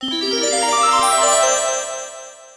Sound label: Dragon Egg